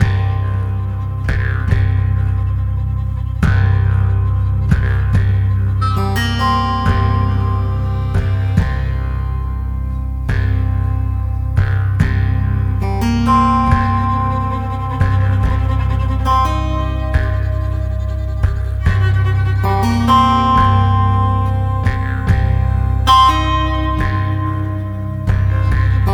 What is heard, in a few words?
intensity; dead or alive 2